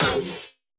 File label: Shield Impact